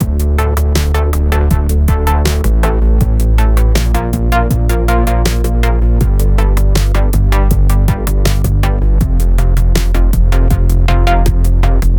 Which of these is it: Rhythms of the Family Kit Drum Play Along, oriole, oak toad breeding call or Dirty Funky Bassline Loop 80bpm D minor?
Dirty Funky Bassline Loop 80bpm D minor